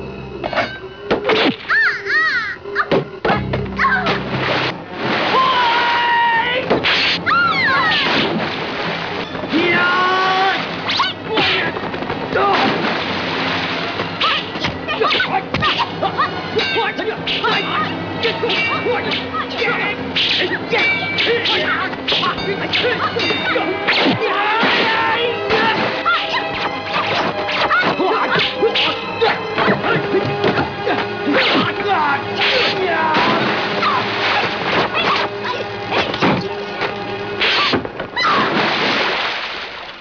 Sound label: Fight; 特酷声音素材库